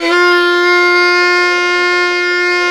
violin F2, Balkanish Traditions

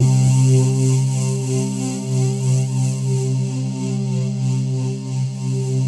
SYNTHESIS; Pro-Rec - Synthsonic; JV FILM

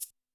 SHAKE, Yamaha SY85 Drums

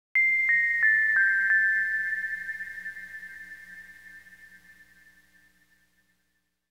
Hip Hop and R&B Drumloops

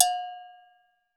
BRAZILIAN, AGOGO BELLS; Voice Crystal - Steve Reid Definitive Percussion

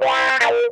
TIMER, Funk Guitar; Zero G - Funk Guitar

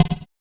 noise percussion; AKAI S6000 CD-ROM - Volume 5

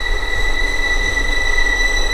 ANGELIC B5

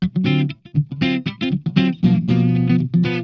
FAST CHUG, Funk Guitar; Zero G - Funk Guitar

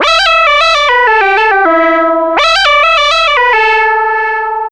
Synth Break; Trip-Hop; Loops; Sonic Foundry (Sony Creative Software); Rondo Brothers Trip-Hop Loops & Samples; Liberation

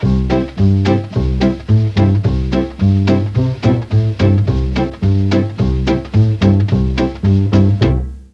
E2 SAX, Mellotron; Mike Pinder - Mellotron Archive CD; FOXTROT+SAX

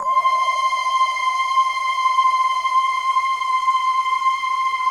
RAIN, Rainstick, Rain Forest; Optical Media International; Sonic Images Library

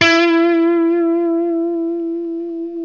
Les Paul, guitar; Spectrasonics; Hans Zimmer Guitars VOL-1

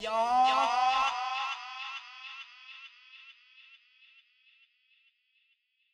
Fx; Yah; Zero-G Sound Sense Grime Scene; GS Construction Kits; The Hoodrat

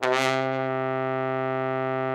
TROMBONE, Killer Horns; Best Service

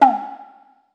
Tsuzumi mf, Percussion; Roland BK-5

